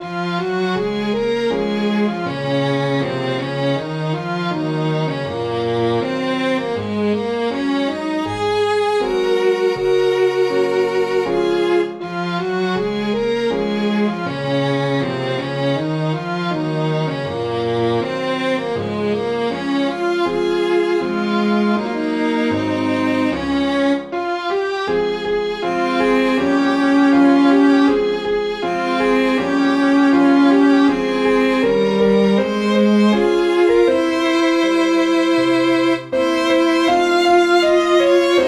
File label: Irish, synth